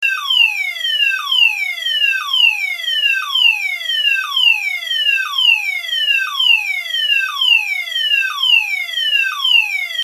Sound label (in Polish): ton